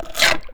BITE, HORROR; AKAI Anime Sound FX

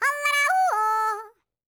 YODEL, World Voices

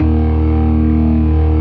27 STOPS, Synth and Organ; InVision Interactive; Keith Emerson; The Most Dangerous Synth and Organ; ALL STOPS

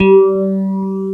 PHAS G4, CHRS GTR; East West - Global Sounds

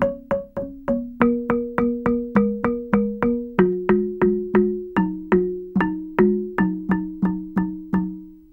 GAMBANG PH; Spectrasonics; Heart of Asia

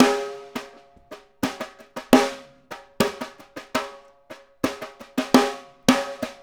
Snare Pattern, Drums; Essential Percussion

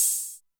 open hihat, Zoom MRT-3B

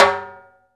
rimshot Timbales; E-MU Producer Series Vol. 2 – More Studio Essentials; Composer; Timbales Xswitch

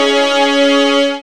synth glide, dance synth; Sound & Vision - Gigapack I CD 2 (Roland)

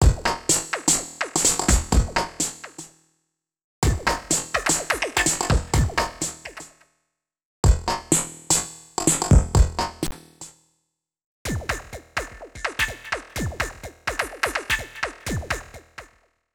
Electro 126bpm; X-Static Goldmine 5

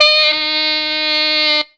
CRY BABY, FUNKY SAX; Zero-G; Funky Elements

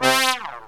SYNTH GENERAL; Megabass Remix! 2

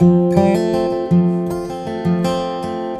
A GUIT F MAJ, 80BPM; Best Service; World Colours

